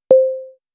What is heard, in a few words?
a short 05 second ui error